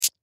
Magnets Rattle, Magnets Rattle Snake Eggs Pick Up Shake; Shaking; Foley; Blastwave